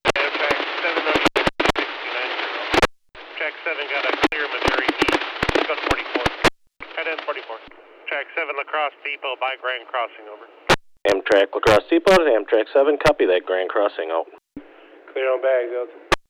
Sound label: Amtrak